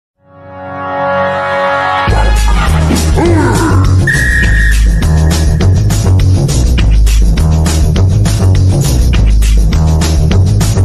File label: PHONK Slowed Reverb; subway surfers